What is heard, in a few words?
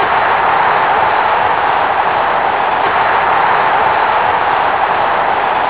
crowd